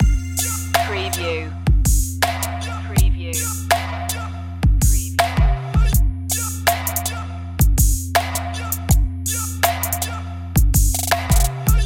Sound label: trap this loop